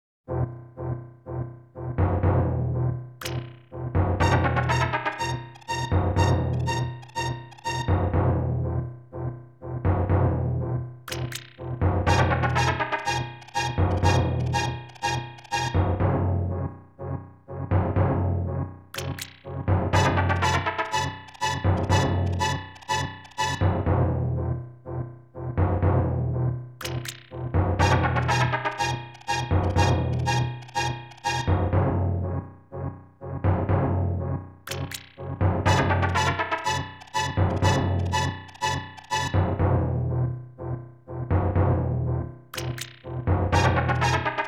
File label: Cave; The Legend of Zelda: A Link to the Past (Official Soundtrack Looped)